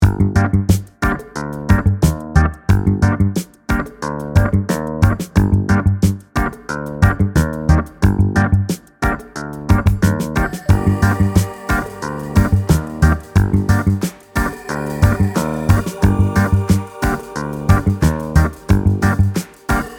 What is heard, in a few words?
ragga bpm90; archibald editions